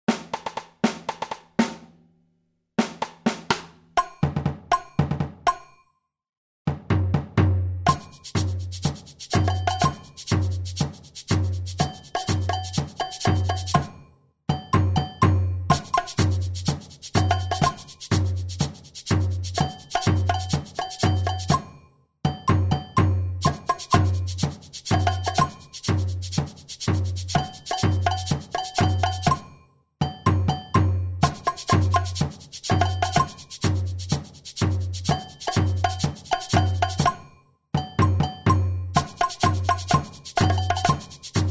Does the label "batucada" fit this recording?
Yes